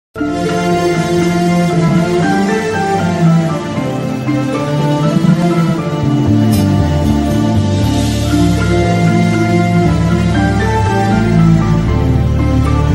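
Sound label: tamil love background music ringtone; mynaa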